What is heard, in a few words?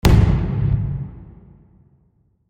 explosion, fireworks